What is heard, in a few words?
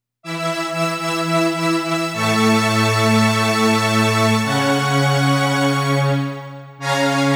pad